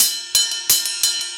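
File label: ride, perc loops, 175, drum and bass; EZRollers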